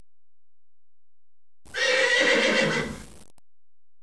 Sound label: HORS; animal sounds